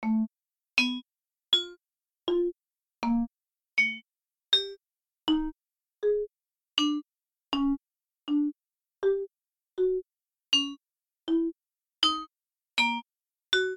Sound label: percussion, chimeras